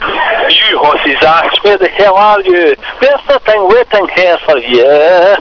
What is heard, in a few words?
phone